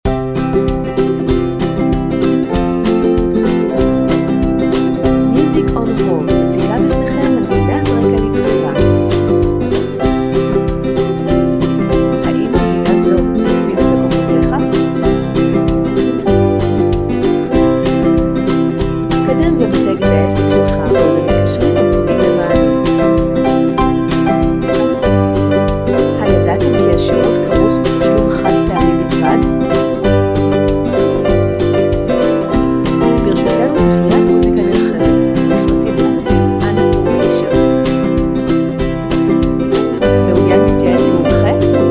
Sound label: happy ukulele loop